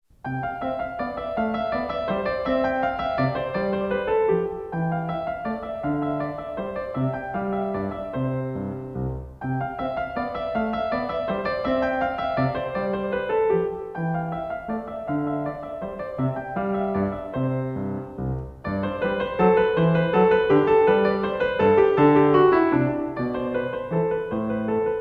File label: Menuet En Rondeau; Magrath Book 03